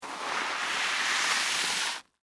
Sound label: Scrape Crackers, Oyster, Debris; Texture; Scrape Sound Effects; Scrapes (Sound Effects:Objects); BlastWave